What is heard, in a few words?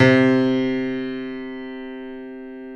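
grand piano C5; DANCE PNO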